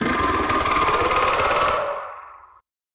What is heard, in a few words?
Figure Growl; Фигура из Роблокс Doors